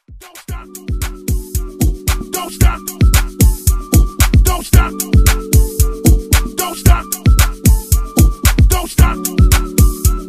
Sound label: Afro Funky; Redrum